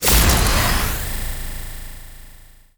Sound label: chainsaw extension intro